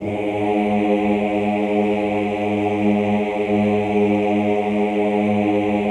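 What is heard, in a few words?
"Ooh" G1 right, choir; Best Service; Extended Classical Choir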